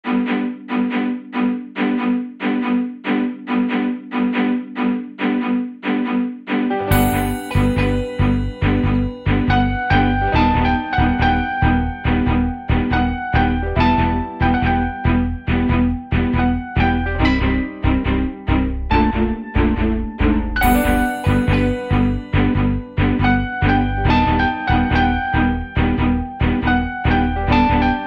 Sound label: white ball piano meledy